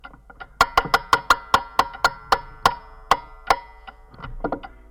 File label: Old Clock Winding